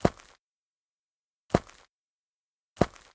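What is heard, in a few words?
footstep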